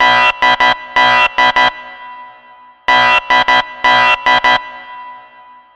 Alarm Army Sound

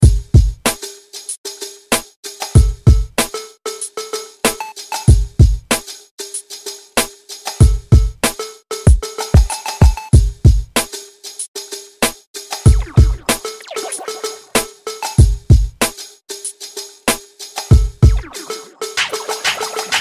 Bebop-beat

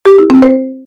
SMS Sound; GTA5

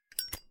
Lighter open

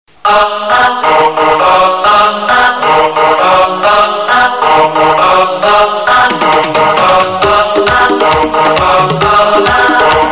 Tone; Vishwatma

